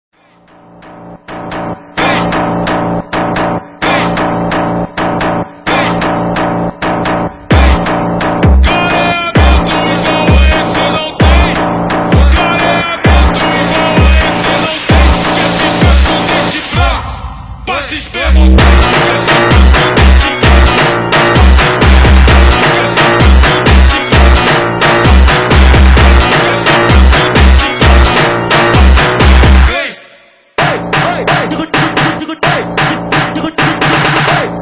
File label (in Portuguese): orquestra maldita funk br